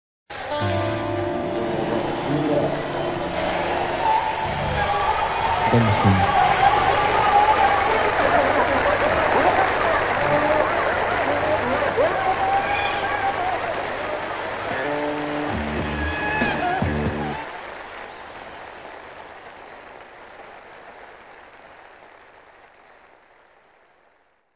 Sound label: applause